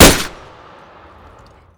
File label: saw fire; svencoop